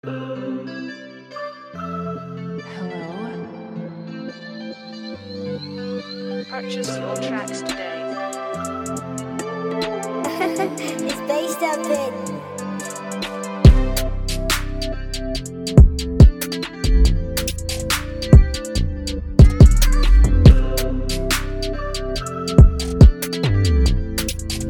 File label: Drill 141bpm Ghana Style